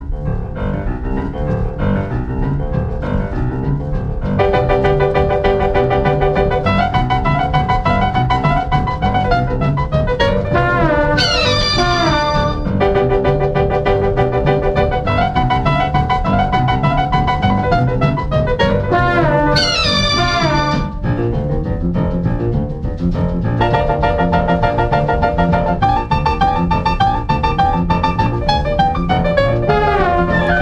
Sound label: piano